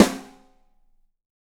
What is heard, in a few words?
25 4X15 L, Drums, TUCSON KIT; Big Fish Audio; Ross Garfield Drums VOL-2